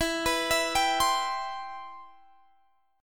E Minor Add Flat 13th

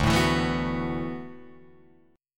D 6th Add 9th D, Guitar, Drop D

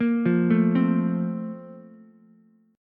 Bb Major 7th Suspended 2nd, Ukulele; BbM7sus2 arpeggiated; JGuitar

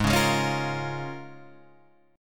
C sharp 6th, G sharp, guitar chord

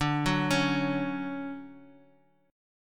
D-Major 7th, D, 4G, 5C, 5E, 5A

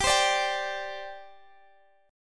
G Suspended 4th G down Guitar Open G

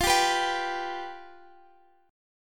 F Suspended 2nd Flat 5th, F; Fsus2b5; strummed; x,x,15,12,12,15

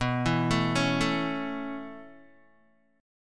B 9th Suspended 4th B down Guitar; arpeggiated

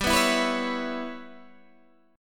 G 6th Flat 5th, Guitar, DADGAD

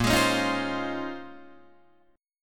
Bb Minor 11th Bb down Guitar DADGAD

